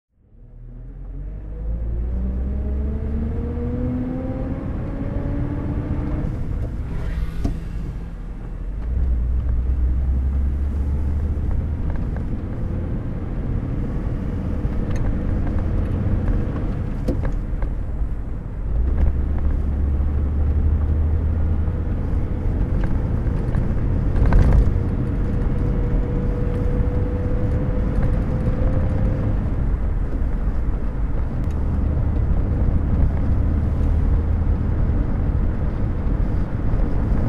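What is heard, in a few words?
bentley mk6 drophead coupe driving; Bentley Mk VI Drophead Coupe, 1950; Sound driving; Engine sound; V3D/ ClassicarImages; Marc Vorgers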